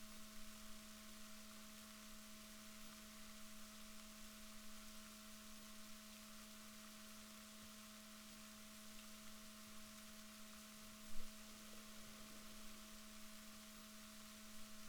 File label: rorquals negatif, Balaenoptera Physalus Chile